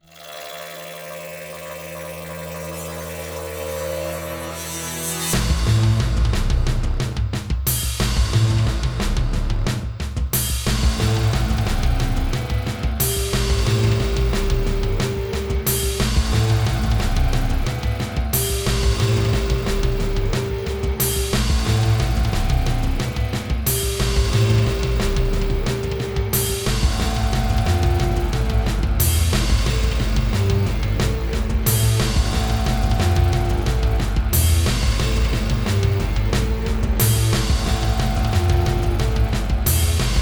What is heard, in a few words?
Toxic Generator (Boss Song); Stage4